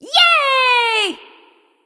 "yay"; smite; nuwa

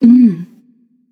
tongue passion; chaac

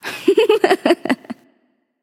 laugh; smite; skadi

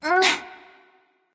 grunt lift; smite; awilix

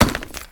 flair back crack; smite; god atlas

